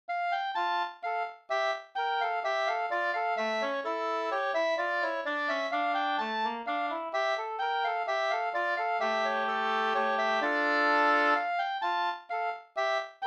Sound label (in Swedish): skanklat